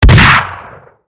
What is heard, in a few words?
Shotgun